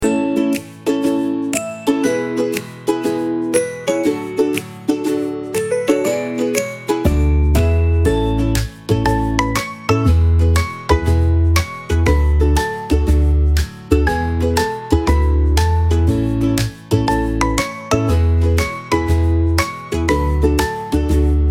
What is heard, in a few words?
ukulele fun loop whimsical